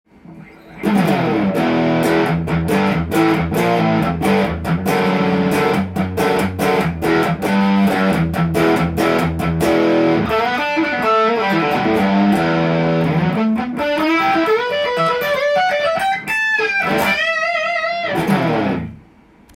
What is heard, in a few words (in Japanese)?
fender mekishico